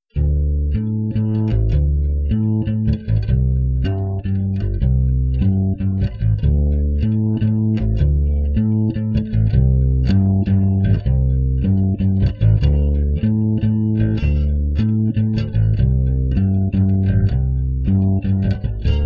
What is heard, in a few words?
bass